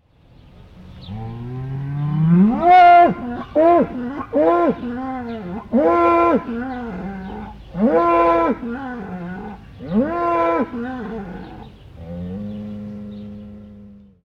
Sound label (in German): Rind schottisch